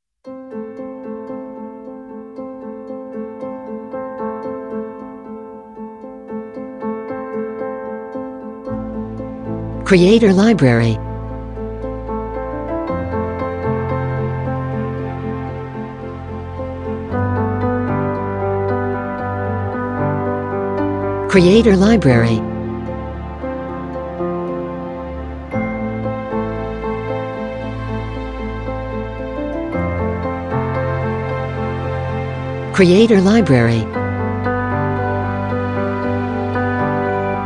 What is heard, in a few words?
Emotional Piano, cinematic music